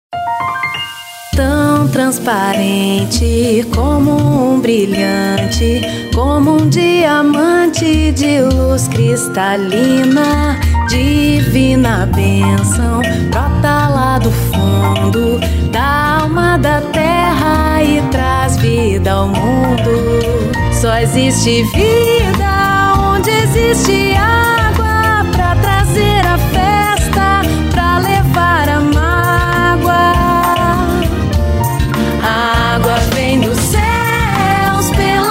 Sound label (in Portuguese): JINGLE ÁGUA